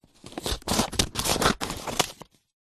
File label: office scissors cut paper